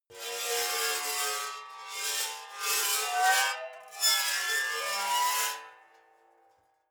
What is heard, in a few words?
metal scrape rich sequence long